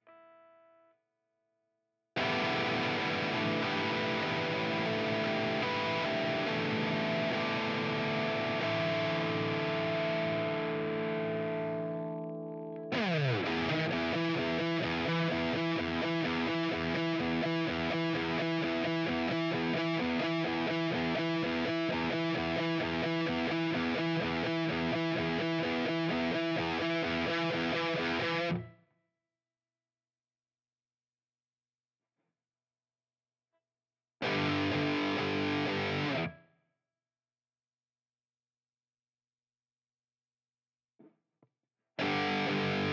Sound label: Guitar